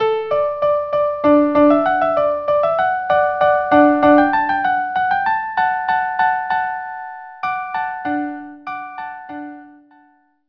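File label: unaccompanied violin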